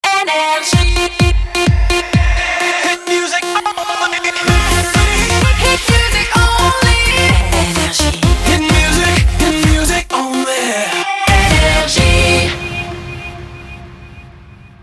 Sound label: jingle; NRJ France; 128bpm